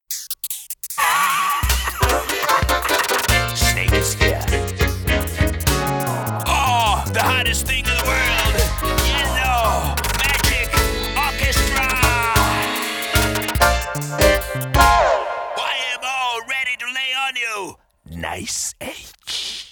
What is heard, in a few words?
Jingle